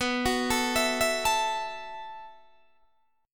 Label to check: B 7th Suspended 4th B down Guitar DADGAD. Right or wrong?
Right